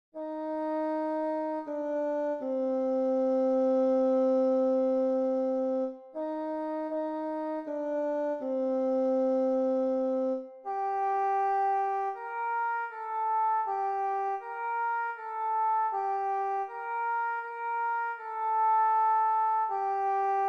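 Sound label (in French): thème jazz basson; concerto pour la main gauche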